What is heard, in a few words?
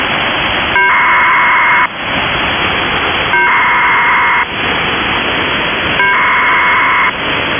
GreenCube USB AUDIO